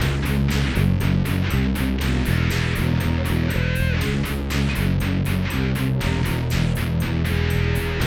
FAT ROCK; Best Service Advanced Media Trax Building Blocks; FAT ROCKA

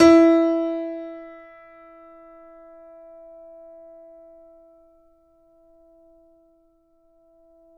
FF E3, Grand Piano; AKAI S6000 CD-ROM - Volume 2; Grand Piano 256M

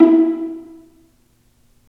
STR PI, Roland String Master Series, Vlas Pizz

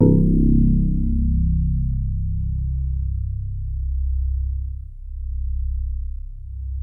DX7 MID2 C1, E-PIANO; Music Store

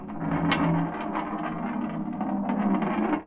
Tin Tray, Marbles, contact mic

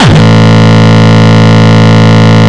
Longkick, kicks

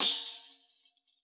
tamb, percussion

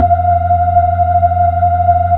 B-3, Hollow

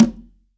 CONGA, STEREO CONGA

